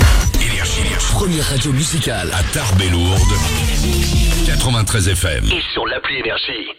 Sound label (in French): JINGLE, Première Radio (NO WAVE); TARBES ET LOURDES, 93 FM; NRJ